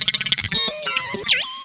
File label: gtr; hellcafe